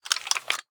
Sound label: wpn gbl plr inspect settle; viper